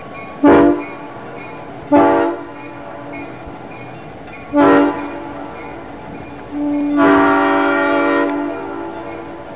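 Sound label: Nathan M5 airhorn; horns courtesy 5CC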